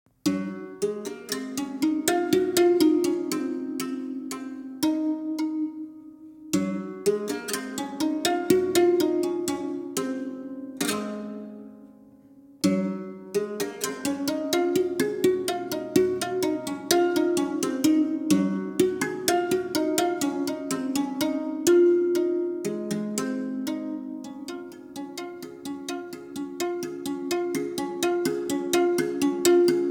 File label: Berimbau Sextet